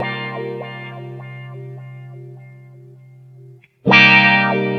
Underwater; лупы

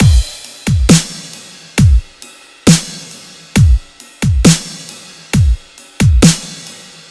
Massive Kit B, 135 bpm

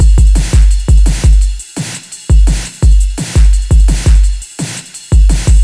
Sustain Beat C, 170 bpm

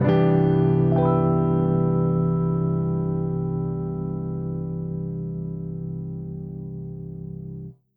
Ending B, keys jazz sample; джазовый

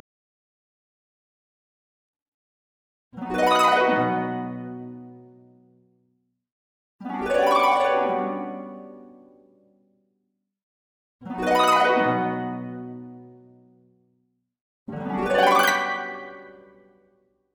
harp

